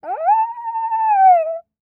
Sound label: Animal Mammal Carnivore Dog Coyote Howl; animals; AdobeCC